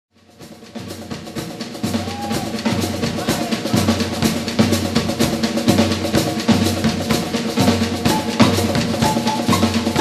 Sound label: Brazil Percussion Group